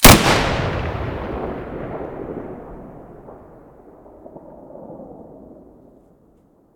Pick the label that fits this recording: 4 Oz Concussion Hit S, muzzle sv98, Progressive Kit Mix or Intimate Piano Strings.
muzzle sv98